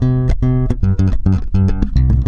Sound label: C#, Bass; Spectrasonics; Bass Legends; 117 CORAZON